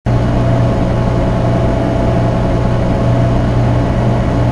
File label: amb comp; cruisecontrol